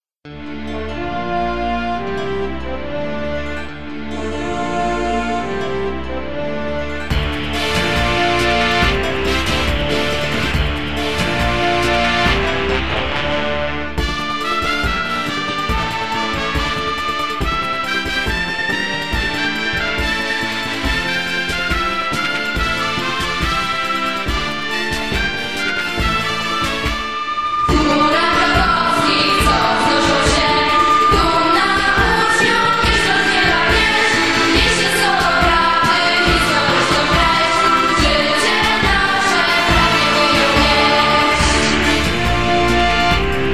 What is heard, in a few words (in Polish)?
hymn szkoly